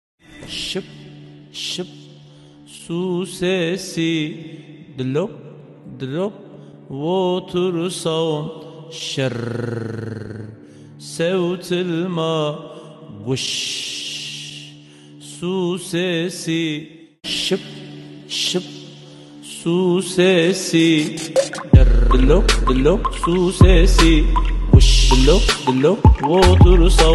sip sup su sesi komik sarki

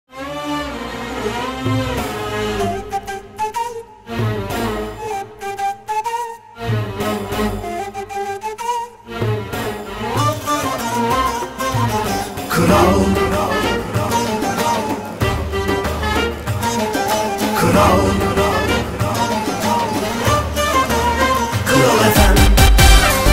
orjinal jingle uzun; radyo tatlises

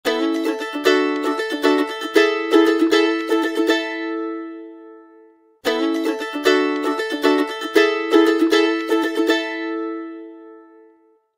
Iphone Guitar Zengi Zil Sesi, Iphone Gitar Zil Sesi; Iphone Guitar Zengi Zil Sesi 2

